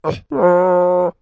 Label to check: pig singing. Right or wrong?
Right